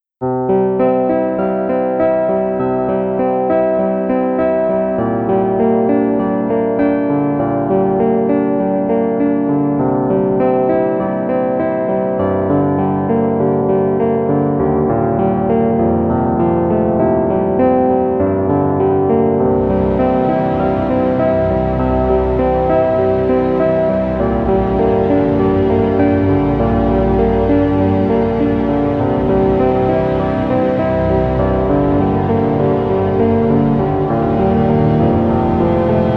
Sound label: calm of flying, 432 Hz